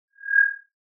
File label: Sound of an owl